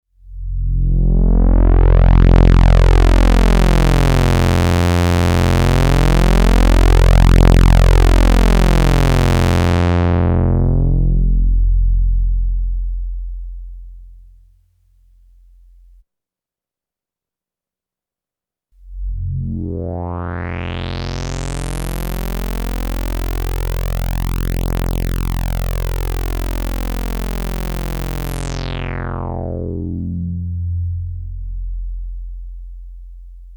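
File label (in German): Filter 2 Sweep mit zunehmender Resonanz ohne und mit Basskompensation, synthesizer; Sequential Pro 3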